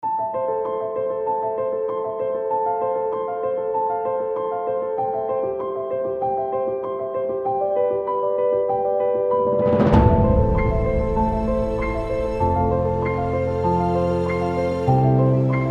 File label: Epic Cinematic Music